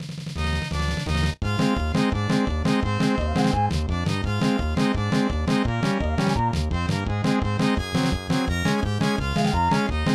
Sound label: Level Complete; Animaniacs; Super Gameboy; SNES